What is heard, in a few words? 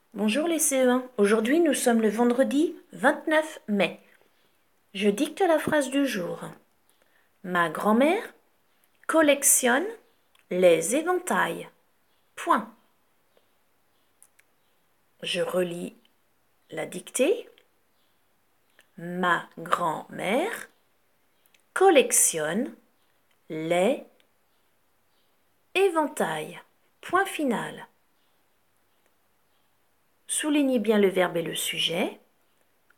Vocal